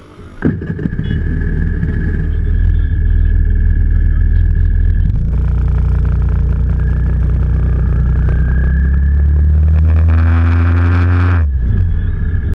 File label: engine